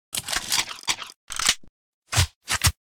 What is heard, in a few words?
reload; EMXArms; pmg90